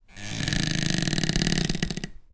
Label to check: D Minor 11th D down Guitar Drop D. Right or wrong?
Wrong